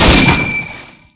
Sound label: garand clip ding; weapons